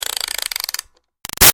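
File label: Toy Music Box Wind Up Medium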